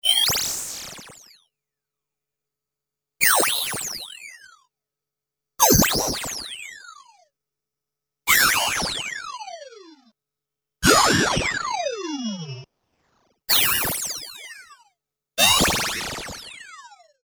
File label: beam short bursts